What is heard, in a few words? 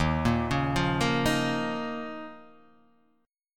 Eb 7th Suspended 2nd Eb; x,x,1,3,1,0,0,0; Eb7sus2 arpeggiated; JGuitar